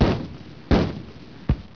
rocket launcher firing

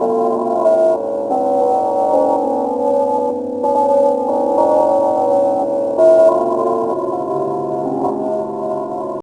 WINDBELL

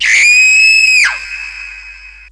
Scream, halloween

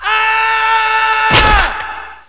male yell

